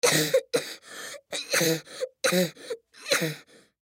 Cough Hack Multiple Male Ten Yrs Boy; Sick; Human; Blastwave

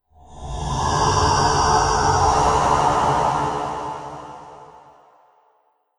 Sci-fi Monster Laser Lizard, alien; Andrew Fleming

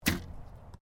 Archery Hunting Bow Firing; Various archery sounds; Miscellaneous Sports

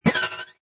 Alarm Digital Pan Whoosh; Scifi Production Elements, Set 2

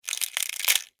Insect, Eat, Bite, Bug; Insects Eating; Blastwave; Animals:Insects

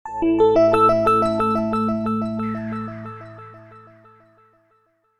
Startup, Computer: Short Musical Style Phrase

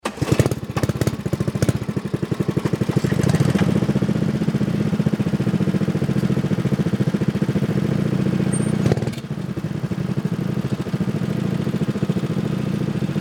Sports Go Kart Start Up Idle Drive Away; Go-Carts; Vehicles

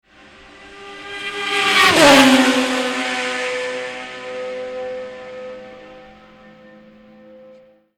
Racecar Formula1 By; Cars; Vehicles

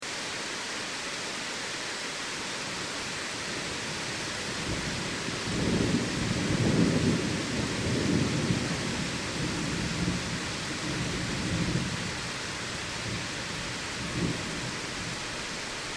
Storm Heavy Rain And Thunder; Weather; Crafted Signals

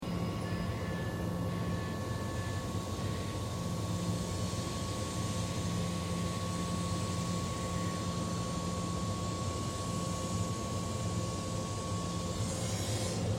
Train Melbourne Interior Idle Alarm; Public Train in Melbourne; Train Sound Effects; Vehicles

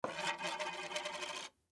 Cd Spin Wobble; Spinning Sound Effects; Foley; Objects; Blastwave

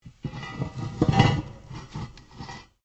METAL ON METAL LARGE SCRAPE; Metal (Sound Effects:Materials)

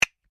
Castinets single, simple wooden persussion toys; Musical

